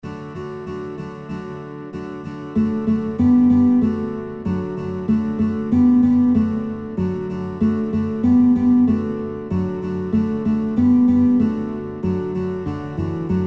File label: Guitar; Guitar Songbook; Hoppe Hoppe Reiter; kinderlieder gitarre playalongs